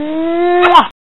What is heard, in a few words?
Kissing People